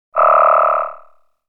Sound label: Swamp animal